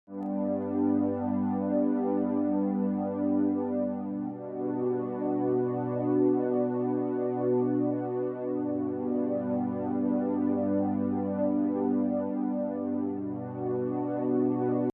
synth pad processed

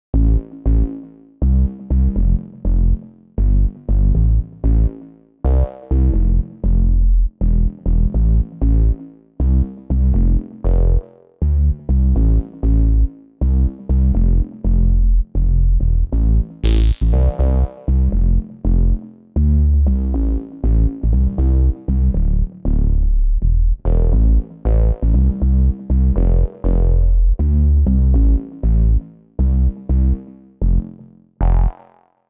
layered bass

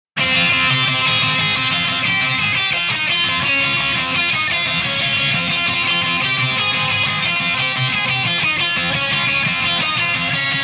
lead gtrs processed

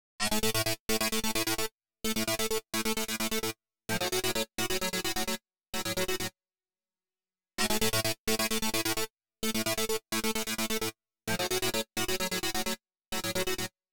rhythm synth original

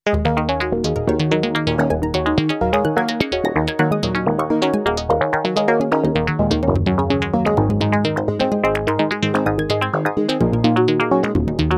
lfo arp

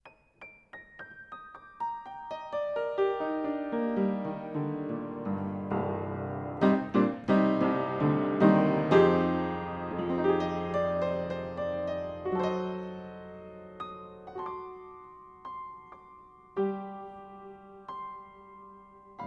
upright panels off 20cm pair

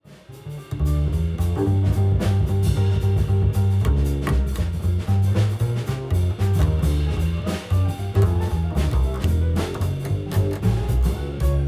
bass processed

